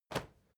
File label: step crowd march quiet single right; schritte